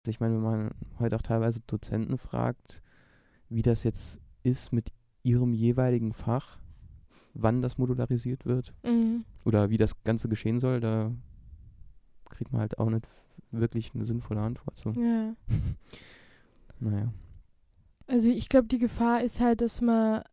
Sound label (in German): abschliessendes Lachen; Tonbeispiel